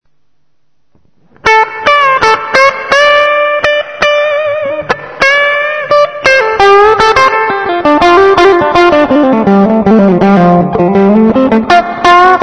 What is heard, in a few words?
HB Bridge clean lead, guitar pickups